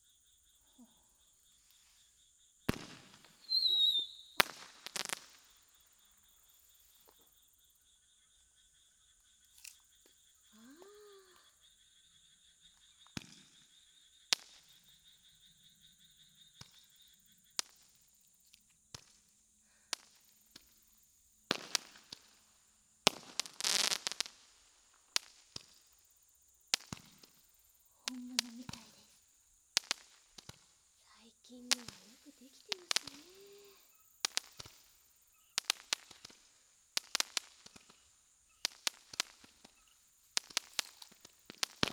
小さい花火; 夜の小道

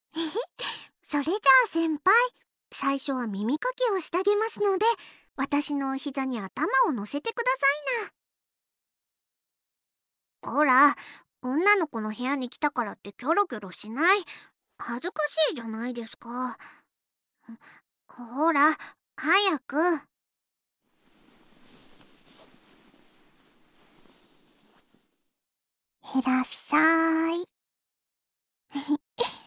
癒やし耳かき; １年B組・猫村のこ編

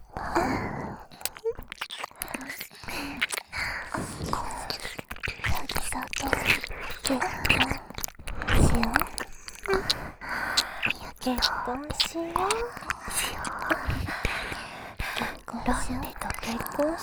耳舐め; 全員

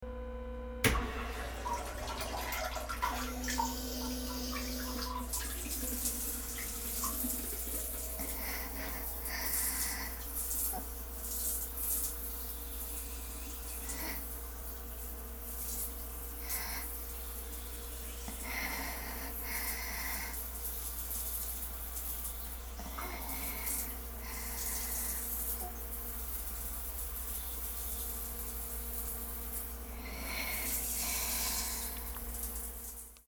ウォシュレット、ゆるやかな喘ぎ; トイレ

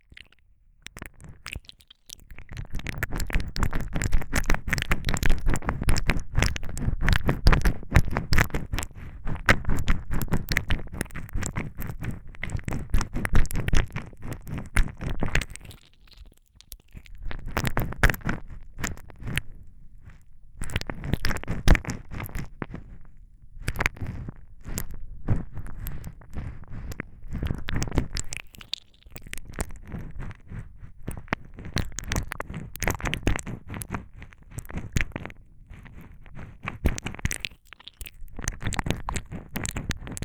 ぐりぐり耳舐め, ASMR

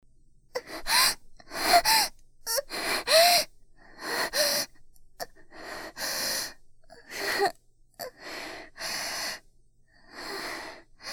狸吐息

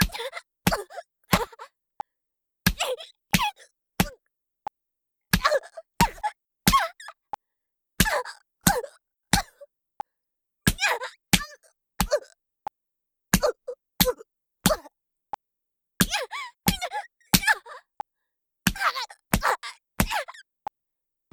ストレート メト BPM90 ワンツースリー, メトロノームあり

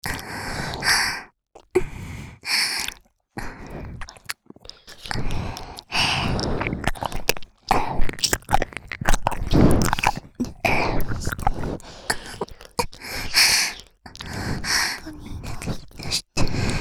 中に出して♪ 耳舐め喘ぎ

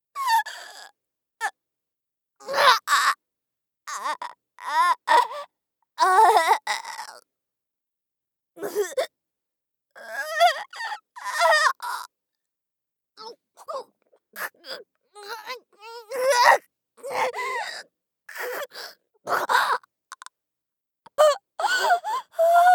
剥ぎ取る; 効果音なし

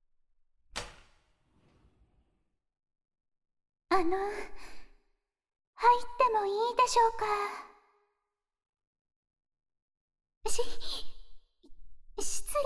洗体・洗髪ASMR; お風呂に…しますか…？

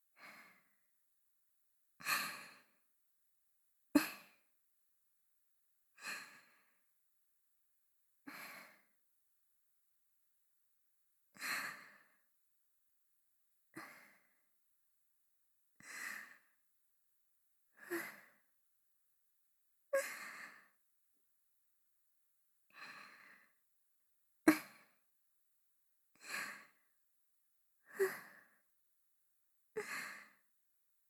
寝息（バイノーラル編集）

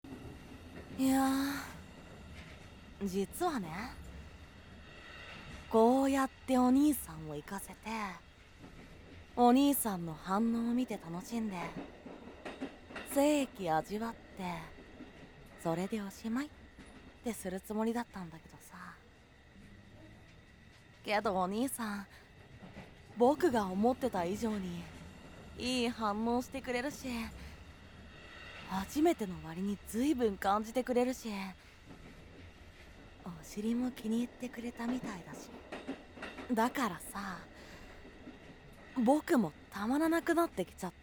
満員電車で挿入; 満員電車で挿入開始

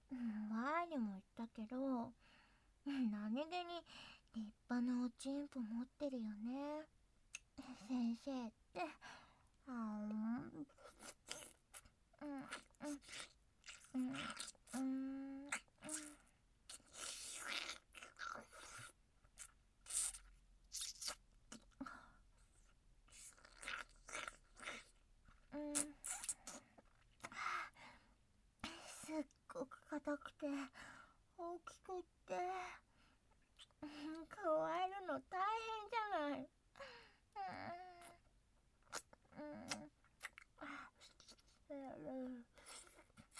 フェラチオ ノイズ未除去; 童貞先生とエッチなおしえごJK

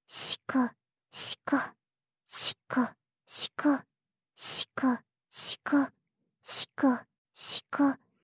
シコシコ オンリー ふつう; オナナビ4 ～ゆかりのオナニーナビゲーション～

